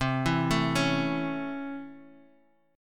C sharp Minor Major 7th Sharp 5th C, chord

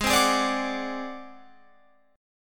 A flat 7th Flat 5th A flat Guitar Open F, chord; strummed

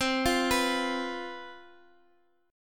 C Major 7th Suspended 4th, C, down, chord; arpeggiated; CM7sus4

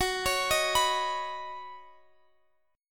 C sharp 7th Suspended 2nd Suspended 4th F sharp

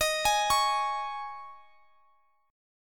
Eb 7th Suspended 4th, Guitar, Standard; Eb7sus4; arpeggiated; x,x,x,20,21,21; JGuitar

